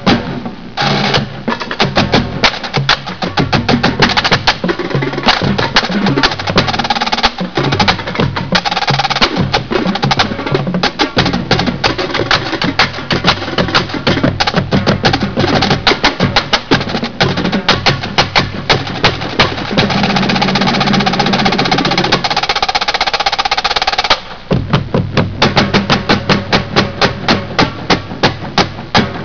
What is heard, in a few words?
Opener- Opener to the '94 show.